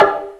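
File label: Synth Cowbell, Fairlight CMI III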